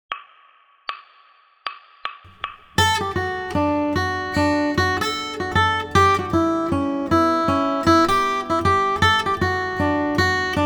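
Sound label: Bluegrass Guitar, easy fiddle tune D; for working on pick direction on off-beat 8th notes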